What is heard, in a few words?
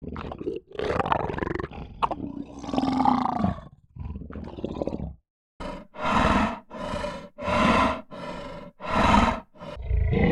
SabreTooth Tiger Vocals